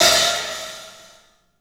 HAT, CLUBHAT, 3D Drums; 3D Sound

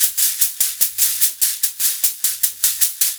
SHAK, Percussion Loops; 150 COMBI

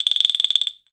CRICKETS, Latin Hand Perc; E-MU Producer Series Vol. 2; More Studio Essentials; Composer

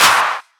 Claps; Vengeance; Essential Clubsounds Vol.4

